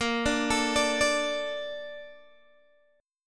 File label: A sharp Major 7th, Guitar, DADGAD